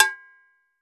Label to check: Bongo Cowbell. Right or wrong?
Right